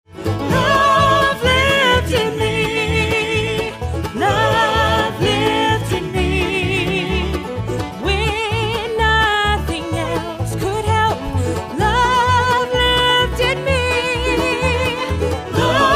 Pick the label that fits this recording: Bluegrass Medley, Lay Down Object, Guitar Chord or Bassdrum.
Bluegrass Medley